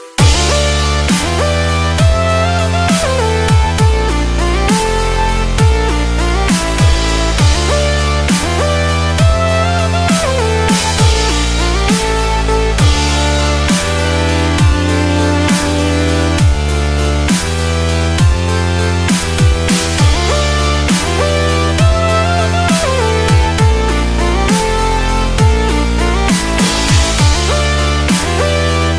STROM MIX INSTRUMENTAL RINGTONE

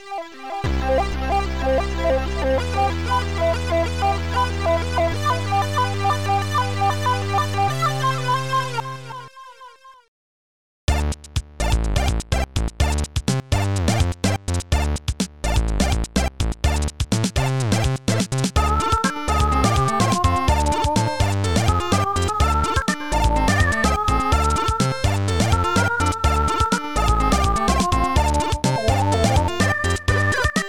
KEYGEN MUSIC RINGTONE; DIGI WATCHER 2.0

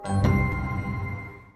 BELL RINGTONE

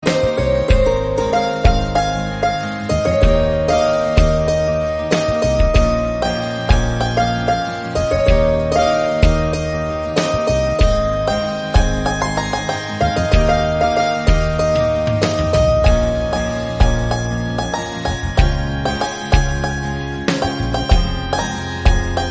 INSTRUMENTAL RINGTONE

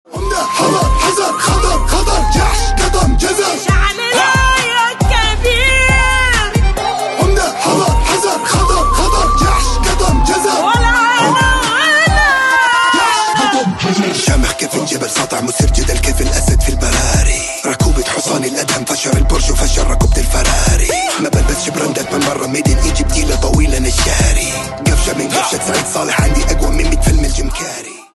ARABIC MUSIC RINGTONE

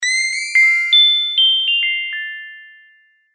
calm chime ringtone

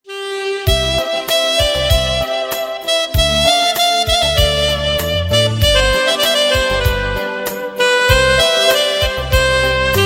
INSTRUMENTAL TUNE RINGTONE

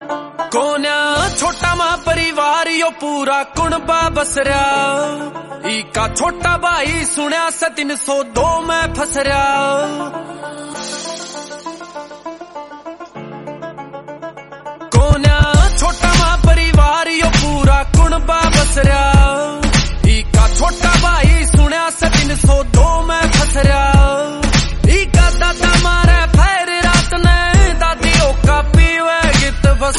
haryanvi ringtone

